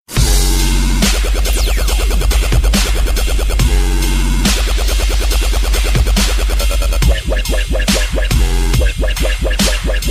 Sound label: Electro Rmx